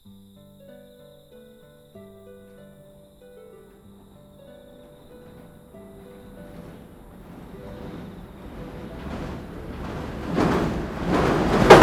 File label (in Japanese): 電車通過 高架